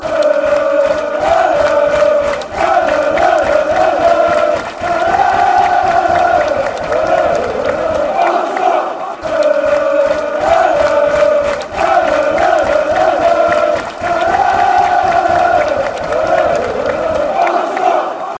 aldershot, chants

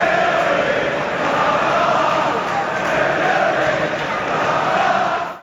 premier league you're having a laugh, chants; hearts

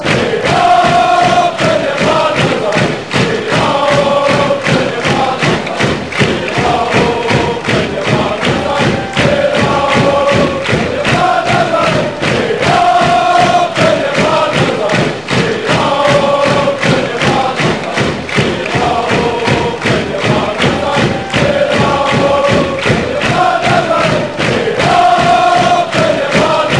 chants; stand up

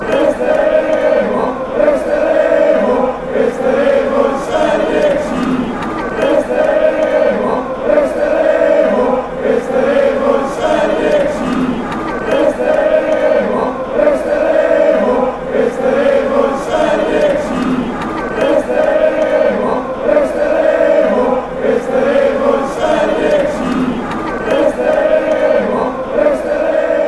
we will stay, fan chants

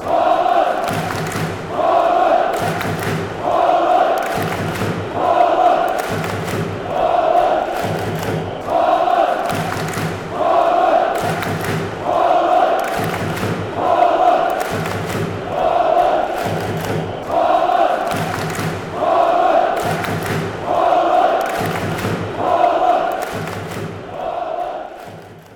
world cup chants holland

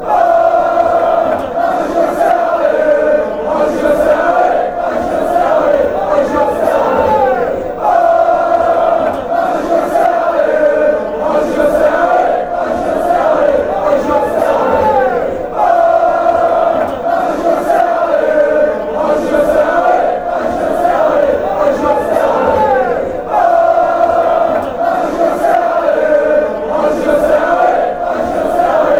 auxerre allez, chants

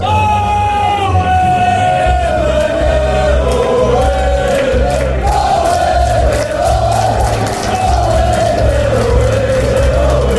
haway dale fanchants

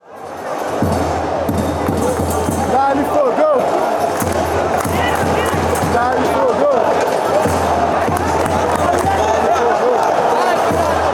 da lhe fogo, chants

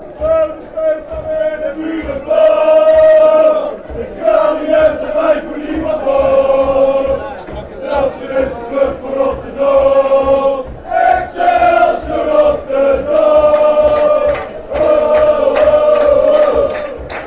fan chants; fire and flame ex

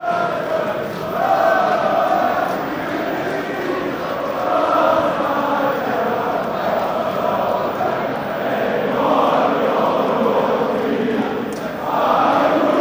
hen wlad fy nhadau fan chants

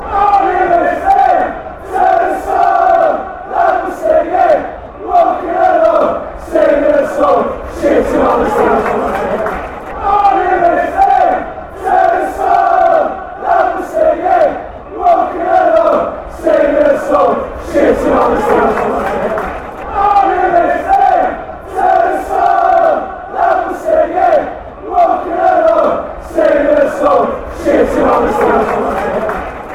fan chants

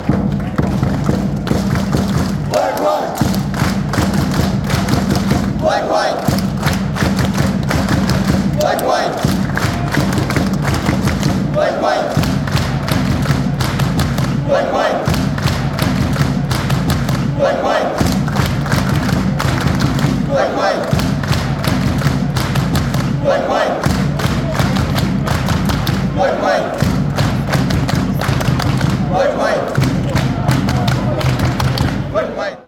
black white, fan chants; international

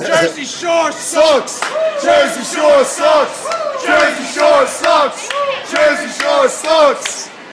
sucks fan chants; jersey shore

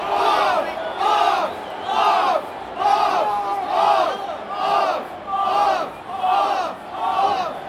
off off off, chants

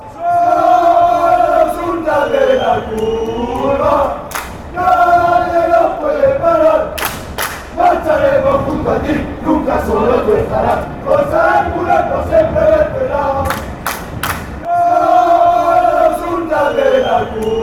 come on, chants; hercules

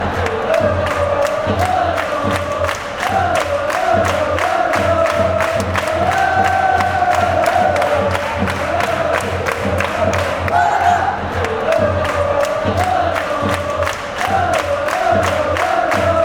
oh oh, fanchants; malaga

